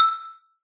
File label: brick combo; candy crush saga online